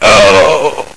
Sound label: die